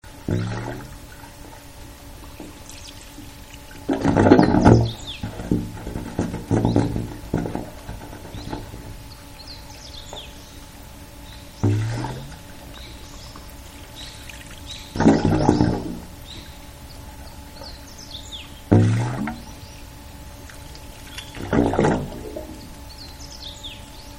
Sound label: pipe